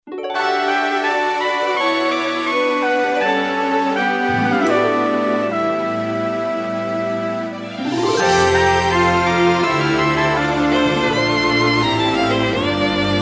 orchestral

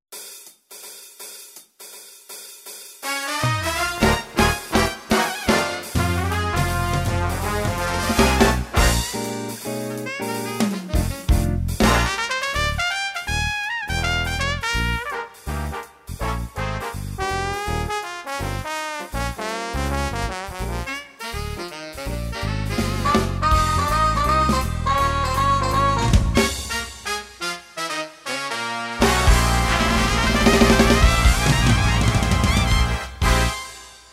Big Band

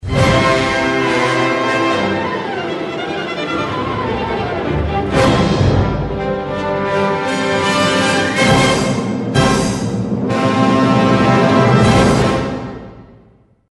orchestral score

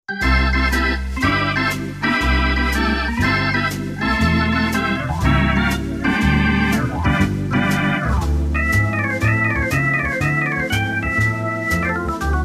traditional organ

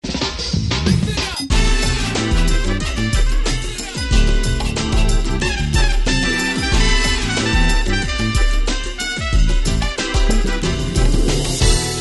Acid Jazz

